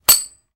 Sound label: Metal Shell Ejects; Small Hollow Metal Impacts. - Kitchen